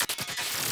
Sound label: metallic